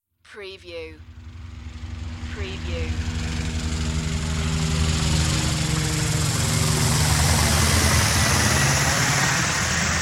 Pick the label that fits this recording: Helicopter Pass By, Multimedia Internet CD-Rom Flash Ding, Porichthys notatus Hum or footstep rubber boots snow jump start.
Helicopter Pass By